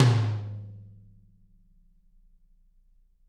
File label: TT, Toms; Bob Clearmountain Drums I